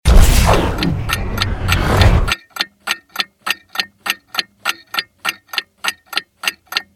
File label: Matrix watch